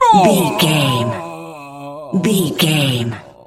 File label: voice male b death low